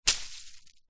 foley plant fern broad leaved hit solid hard ground